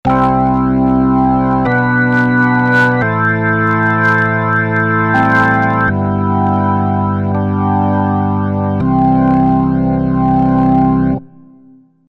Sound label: Crumar Mojo Desktop